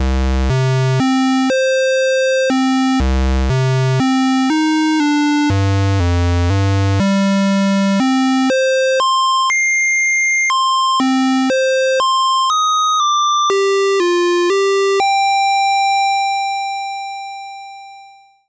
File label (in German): SH-101 Rechteck offenes Filter